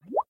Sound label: bubble